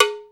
large cow bell; hr16

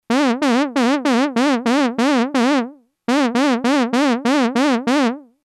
lfo; nord; nord3

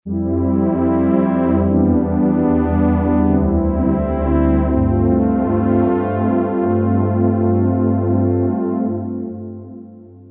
lfo poly; nord3

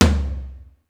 flr amb tm; sr16 tom

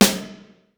plate sn; sr16 sd

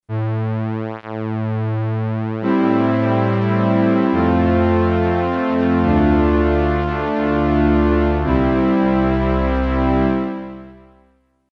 lfo shape; nord3

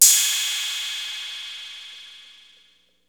cymbal, tr808

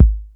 bassdrum, tr808

